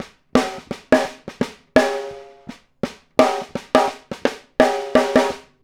snare pattern; Drums; Snare Patterns; Essential Percussion

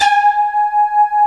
LINEAR SYNTH

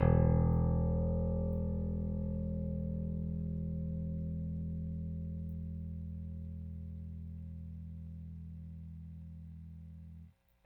Rickenbacker Bass; Airwindows

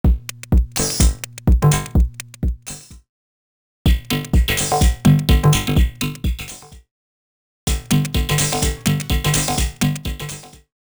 Electro 126bpm; X-Static Goldmine 5

